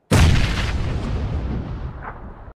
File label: grenade sound effect gaming sound effects; pubg